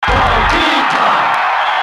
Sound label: Cheer; Donkey Kong; SSB